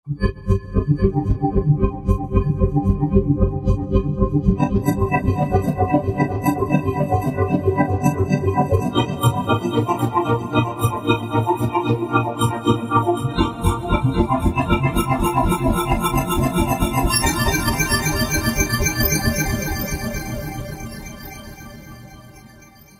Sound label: Flourish, Roland JD-990; Factory Patches